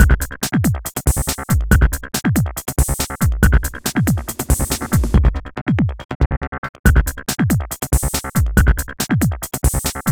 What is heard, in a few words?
Processed Beat, Drums & Percussion